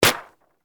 bullet hits paper

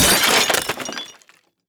kitchen cutlery

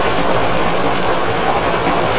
gravel wet drive

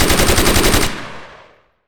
machine gun fire

